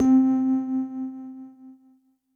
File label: Synth Prophet One Shots, Keys C; Electronisounds